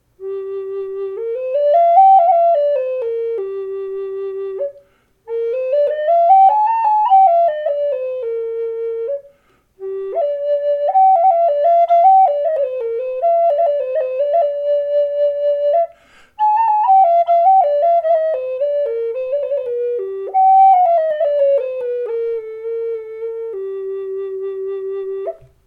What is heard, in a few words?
G4 Spruce, flutes; Shadow Hawk Flutes